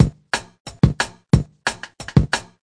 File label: 179 basic tinny, drum loops and breaks; 161 to 180 bpm